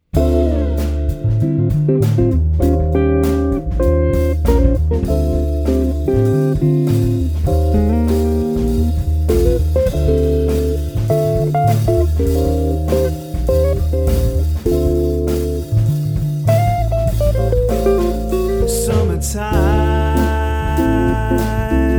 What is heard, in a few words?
Melody